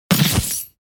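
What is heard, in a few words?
KATANA SWORD normal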